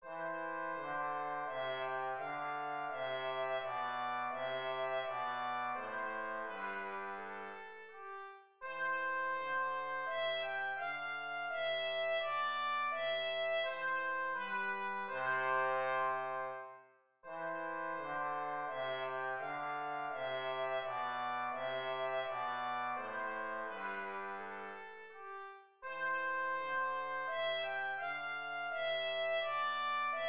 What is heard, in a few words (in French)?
menuet majeur; bach johann christian